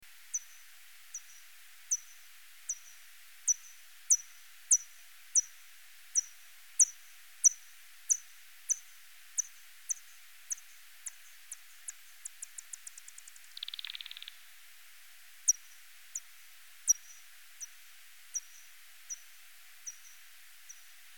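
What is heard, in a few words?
Vespadelus pumilus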